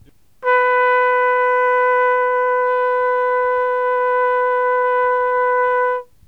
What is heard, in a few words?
trumpet